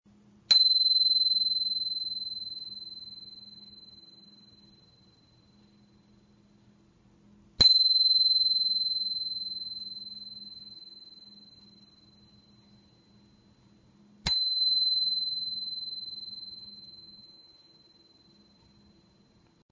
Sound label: Bell